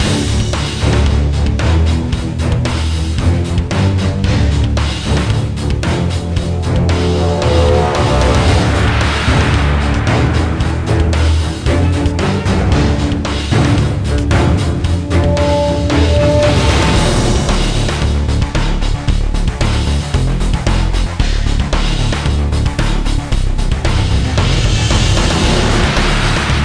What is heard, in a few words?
Environment Music